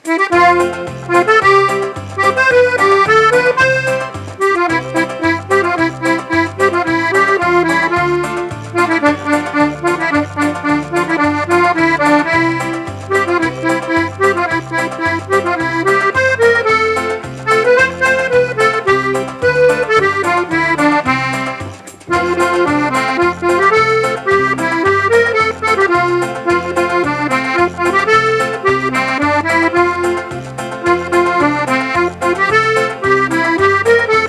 country

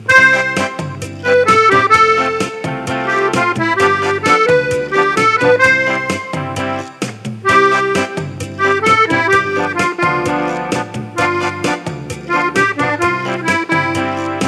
cha cha

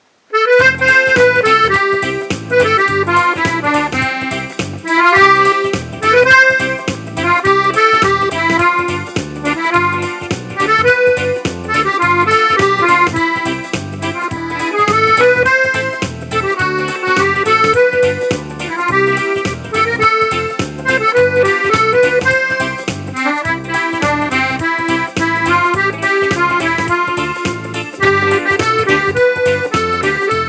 Reggae

